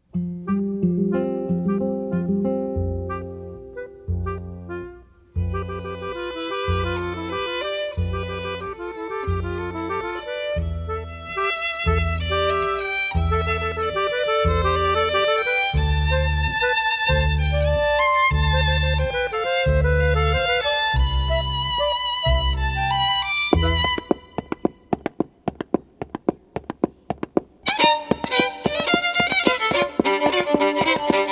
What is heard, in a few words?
transition with fiddle and step dancing